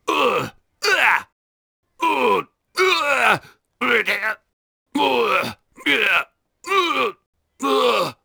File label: HARP